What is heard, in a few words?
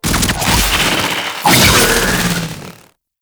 Pod Check Kill Sentients; Cinematics; Grineer Pod Check